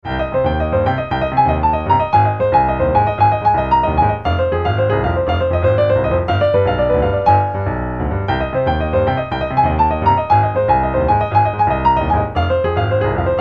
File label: Boogie Latino